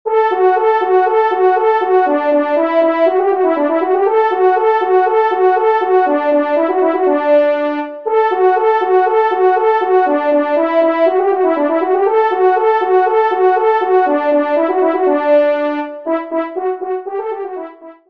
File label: Trio Allegro